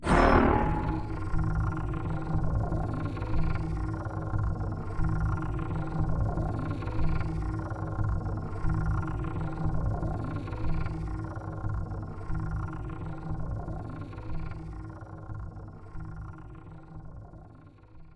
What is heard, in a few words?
Flag Dropped Loop; CTF